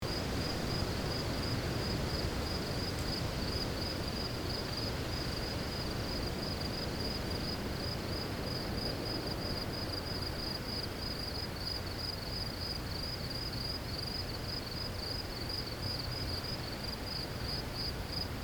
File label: Quiet desert night ambience loop